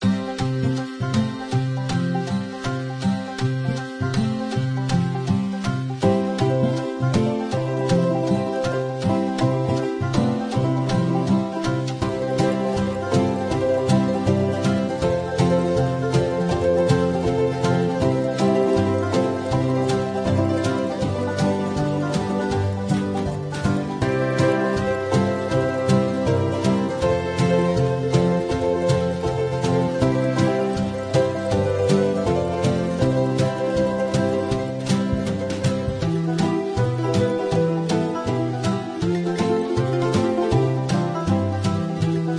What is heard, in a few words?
Train Area Music; Hay Day; android